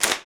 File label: CLP HANDS, Handclaps; Roland LCDP14 Africa VOL-2